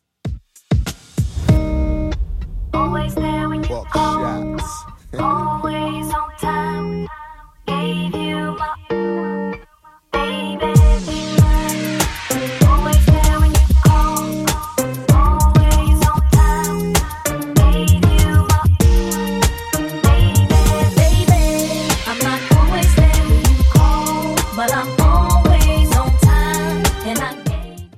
Throwback Rnb Redrum 97bpm

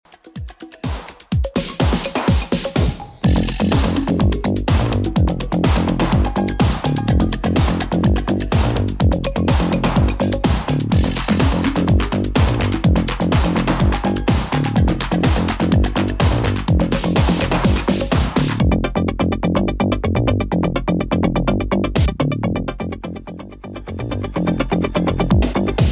80s Redrum 125bpm

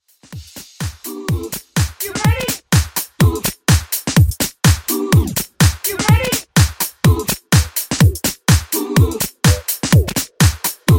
House Bootleg 125 Bpm Dirty

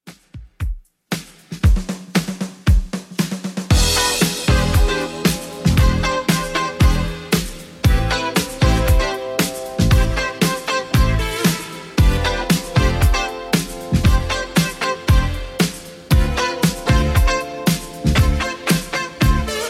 80s Redrum 116bpm CLEAN

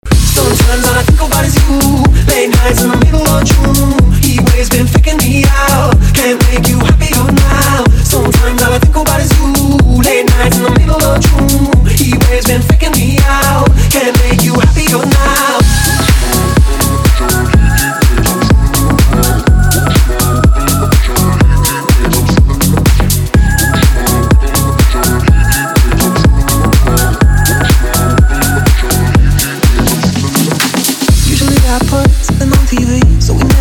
Club Party Banger

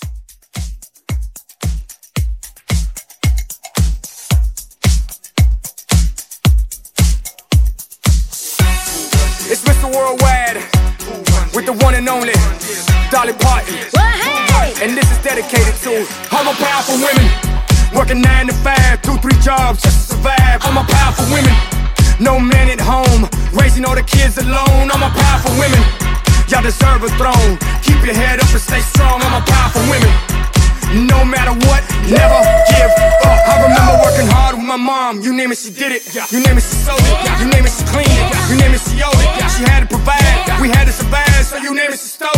Club 112; remixplanet

